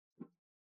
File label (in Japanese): グラスを取る音